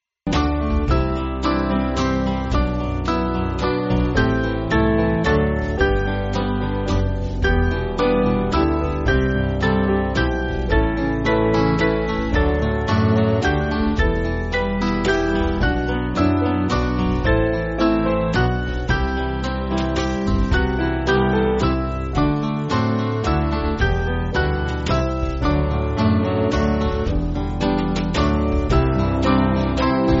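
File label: Band, Music Backing; Come Holy Ghost; Veni Creator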